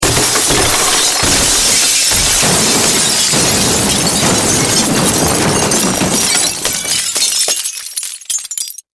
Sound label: Impact Crash Wood Glass